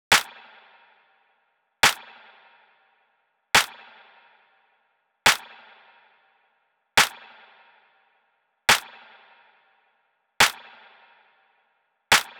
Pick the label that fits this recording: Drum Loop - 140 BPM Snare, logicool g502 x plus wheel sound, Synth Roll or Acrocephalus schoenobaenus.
Drum Loop - 140 BPM Snare